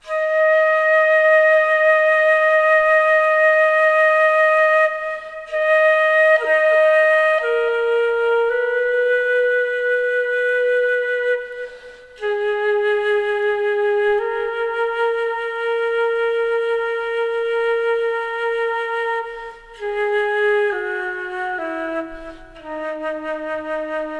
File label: Flute Backing Track Ultra Chilled